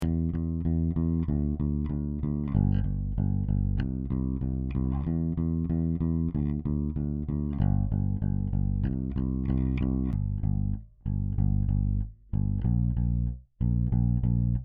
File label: Fun Bass Line